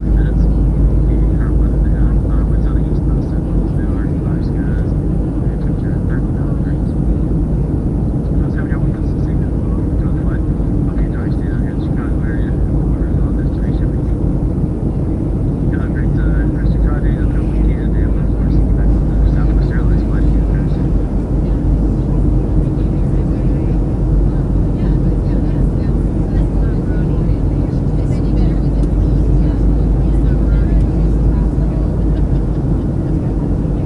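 aircraft inflight walla airplane commercial cabin during flight ambience talking laughing; fvtt sonniss gdc audio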